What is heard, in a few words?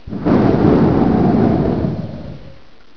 BLOW